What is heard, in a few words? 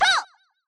jump; Mystical Ninja Starring Goemon